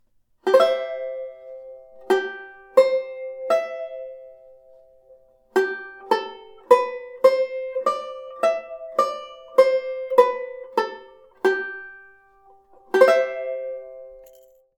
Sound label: 2nd Inv., banjo; Beyond Chord Melody Volume II